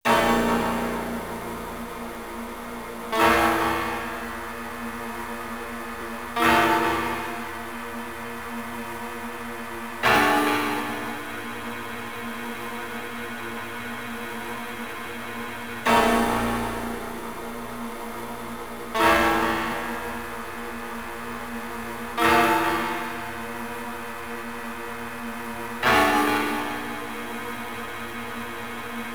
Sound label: BGM Cave, Abandoned Mine; Dungeons & Dragons Collection; Saturn